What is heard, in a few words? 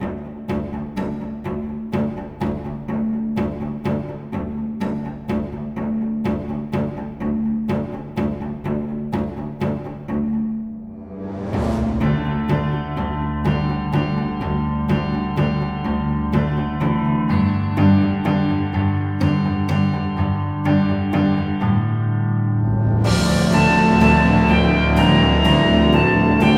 trailer valse